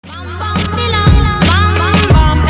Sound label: latest notification ringtone